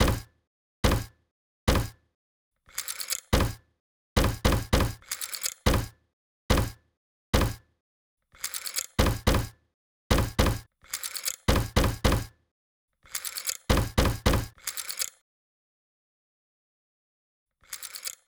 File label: morsecode